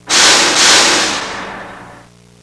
TRUCK

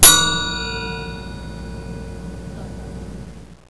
CHING

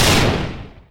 flash explosion

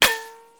bullet ricochet metal

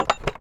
old lock

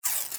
tape rip

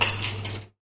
coins moneybox

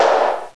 HOT SNARE

